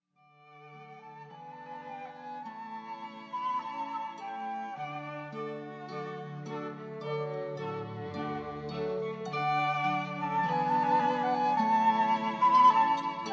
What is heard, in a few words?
trumpet voluntary